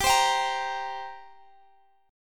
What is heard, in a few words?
G Minor Double Flat 5th G, Guitar Standard; strummed; x,x,17,17,20,18